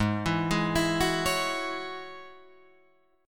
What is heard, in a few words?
Ab 7th Suspended 4th Sharp 5th Ab down Guitar Open F; Ab7sus4#5 arpeggiated